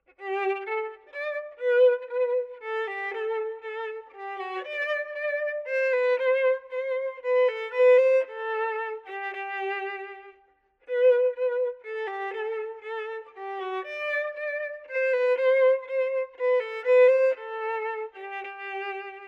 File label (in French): Violon